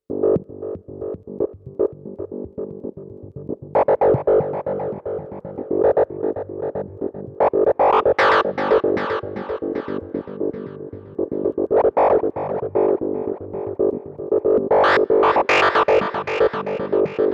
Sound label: Arturia V Collection X Mini V4; Mini Ballade Forestaire